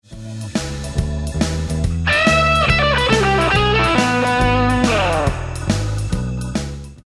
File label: Lick, guitar